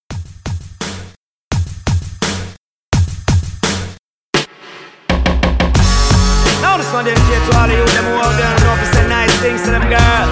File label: Pop Re Drum 85